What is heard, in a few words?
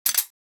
Grineer Emplacement Mech, Weapons